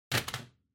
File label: Calculator Drop